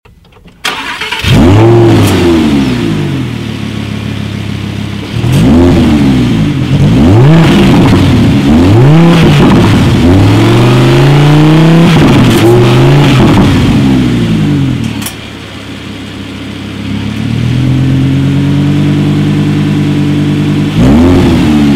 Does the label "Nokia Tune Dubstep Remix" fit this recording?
No